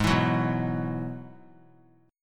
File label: Ab Minor 7th Sharp 5th Ab, down, 3C, 3F, 3A, 3D; strummed; JGuitar